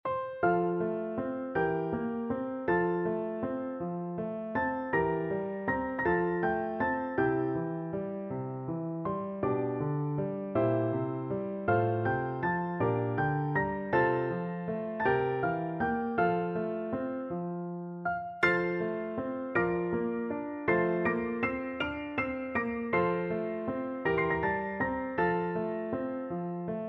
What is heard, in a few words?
plaisir martini, piano duet